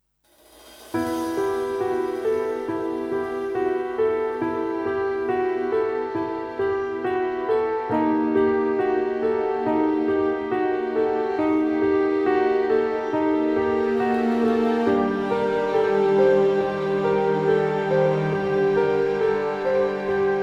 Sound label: Dark Tension